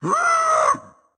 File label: Dash Wind Up; Caffiend Caelan